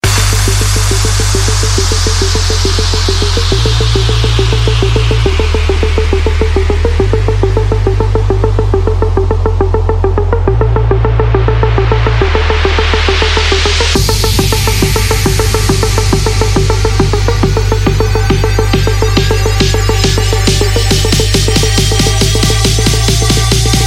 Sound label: Psy Trance